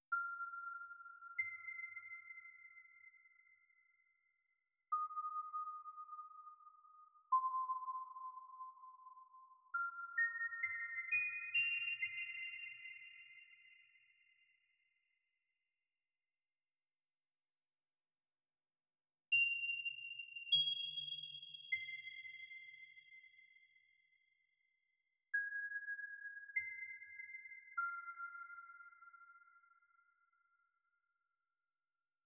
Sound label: Noise FX Ambient; Jumbly